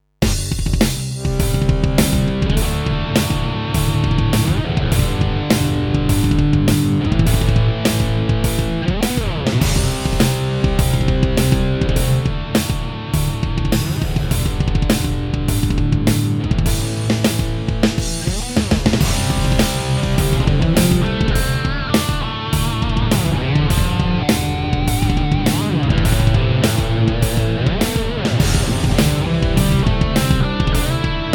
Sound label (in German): Metal mit Looper